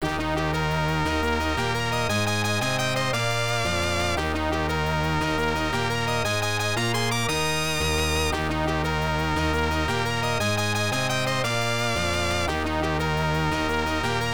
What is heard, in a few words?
Theme Score Display